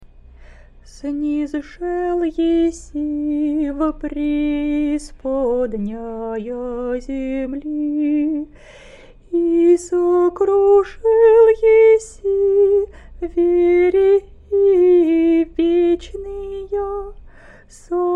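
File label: easter canon byzantine hirmos slower, singing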